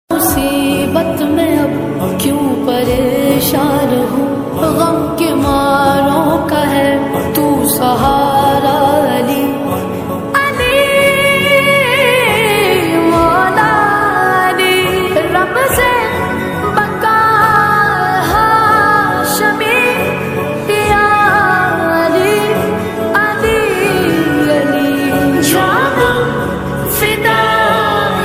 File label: Islamic ringtone